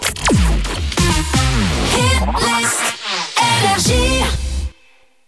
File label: Jingle; NRJ